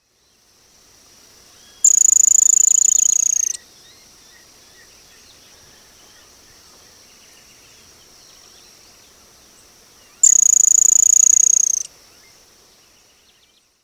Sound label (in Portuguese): pica pauzinho barrado